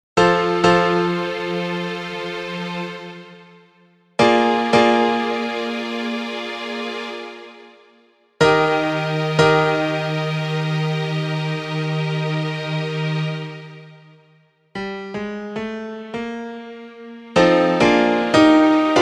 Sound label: instr; Music Man